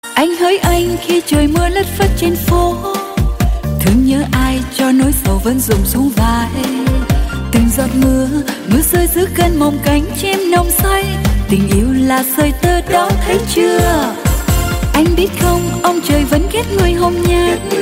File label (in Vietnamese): nhac chuong